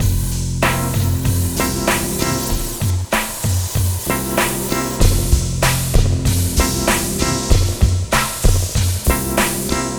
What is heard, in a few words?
Acid Mod Full-F, lounge, 096; Zero-G Kocktail Kollection; Construction Kitz